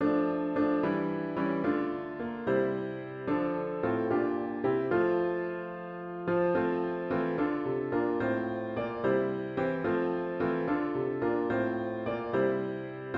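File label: Hymn piano with Intro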